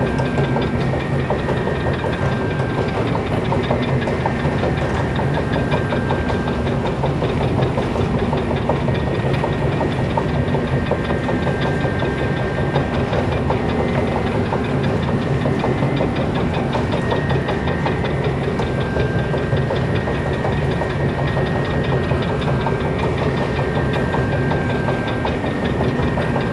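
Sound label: Assembly Conveyor Move Distant; Boomtown; Titanfall 2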